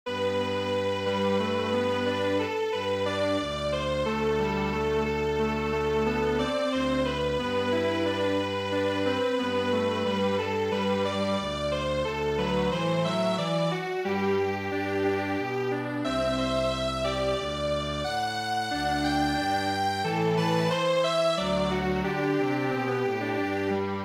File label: Strings Quartet; for my dear